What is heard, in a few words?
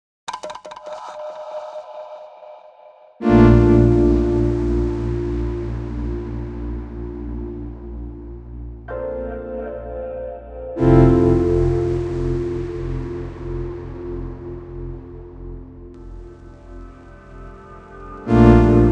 Pads; Gonner; Sally Pads